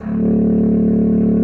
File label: bassoon D1; Xsample VOL-6; C BASSOON